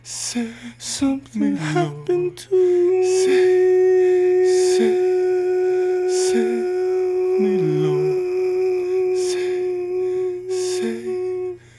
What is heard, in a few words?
mixture, singing; singing librispeech; iSRNet; leyVox